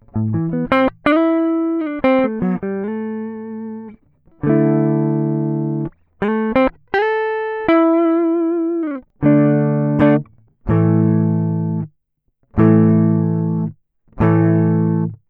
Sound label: noise issue